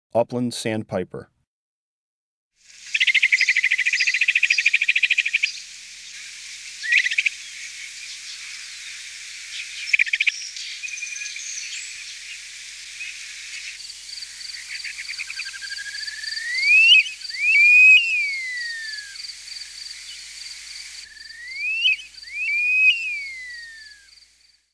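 Upland Sandpiper, Breeding Birds of the Chicago Region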